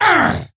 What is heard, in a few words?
wound, creature; Shadron